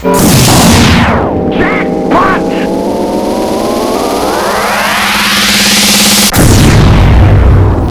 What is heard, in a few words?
jackpot